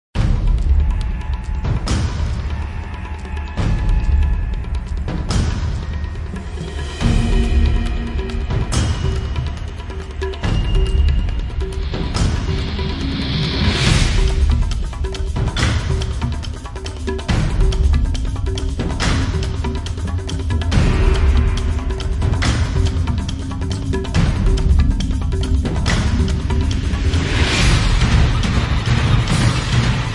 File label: Tribal Threat, Relentless Percussion; Gothic Storm Music